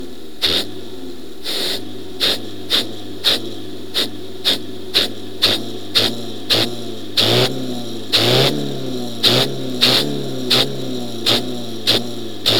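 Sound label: normal 10 before top dead centre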